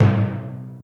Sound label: march tin